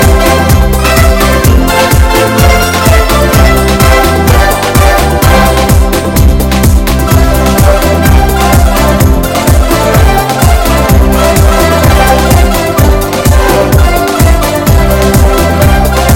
Unique Pretty Sounds

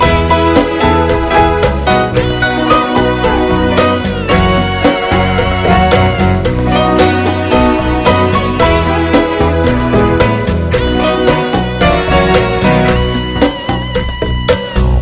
Slow and Hot Tango

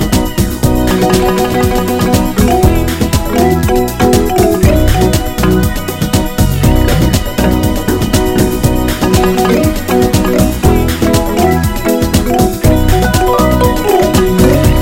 Sounds of the Caribbean

